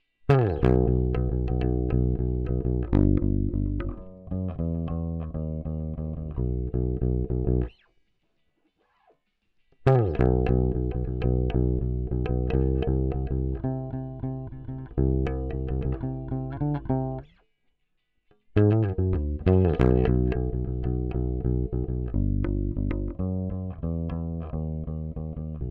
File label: Bass Dry, Punk